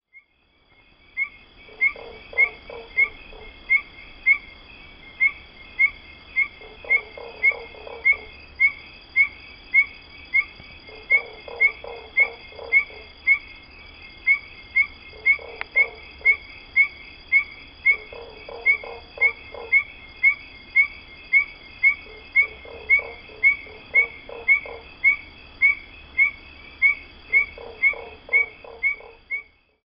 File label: Aplastodiscus perviridis